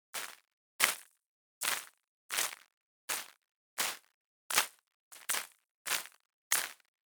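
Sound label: synth gravel, Physically-informed sonic models; The NIW Project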